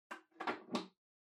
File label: Pendulum Clock Hardware Far Key Insert